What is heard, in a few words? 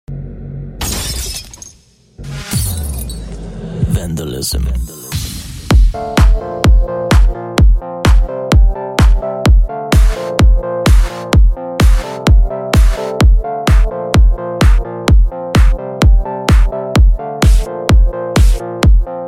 clap